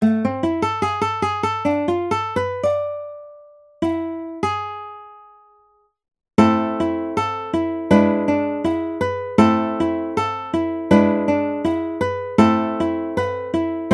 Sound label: Sakura Sakura Claw Baritone, ukulele